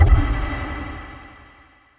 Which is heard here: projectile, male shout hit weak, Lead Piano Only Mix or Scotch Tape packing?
projectile